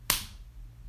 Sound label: slap; Whorblox; ROBLOX GAME ASSETS; SEXUAL sounds